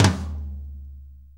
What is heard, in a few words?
4-4 Tom Flam, Drum Loops; Drum Tools; Flams